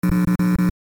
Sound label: Electrical buzzing burst speaker glitch short